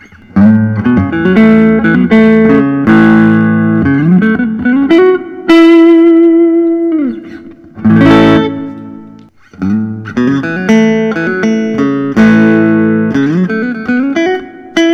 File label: paul reed smith custom 22 black gold; prs